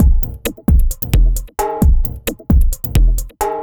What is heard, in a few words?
Complete Loop, Dance Beats